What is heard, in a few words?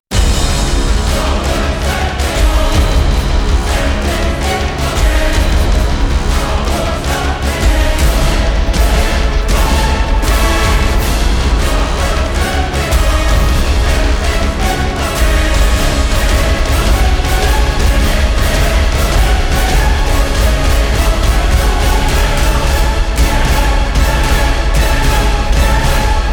Orchestra And Choir Only, Epic Choral Action